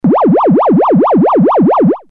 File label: lfo tri; 三角波; LFOの波形はTriangle（三角波）に固定されている; nord